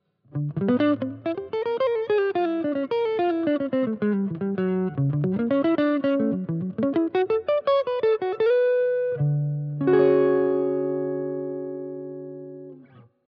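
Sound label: Clean wet Lead, Gitarre; DUAL CUBE LC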